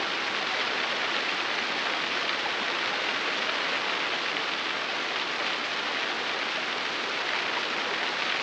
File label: rain, weather; factorio